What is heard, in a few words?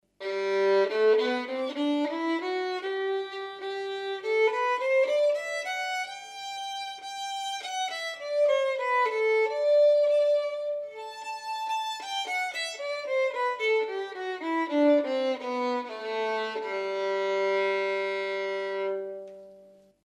antique vn; tadioli